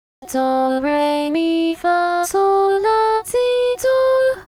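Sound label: key solfege English; KITA; 高音キタ; serious + dark + soft; A mature and reserved style.; ballad, ambient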